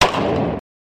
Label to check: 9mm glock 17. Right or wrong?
Right